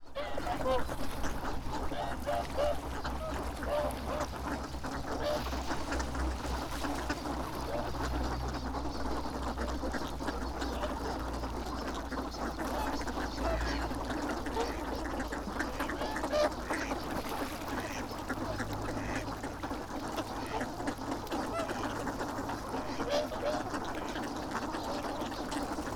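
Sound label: abukuma, Fukushima Soundscape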